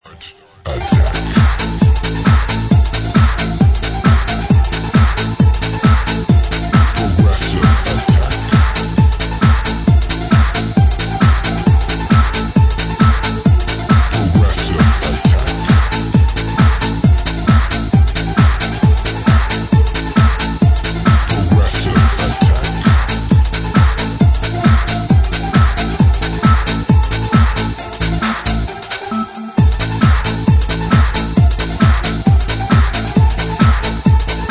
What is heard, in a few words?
Progressive attack